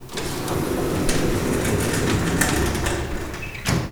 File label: elevator door close; soundscrate